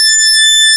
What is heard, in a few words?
SYNCSWEEP A, ARP Synths; E-MU Formula 4000 Series Vol. 3; Analog Odyssey; ARP Synths II